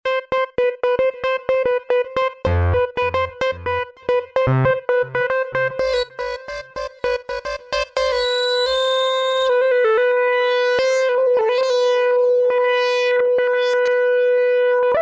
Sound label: Virtualvolt, Roland V-Synth